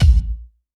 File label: Yamaha CS1x Drums